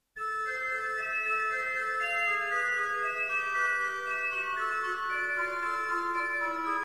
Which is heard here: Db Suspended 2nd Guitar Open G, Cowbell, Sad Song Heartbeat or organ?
organ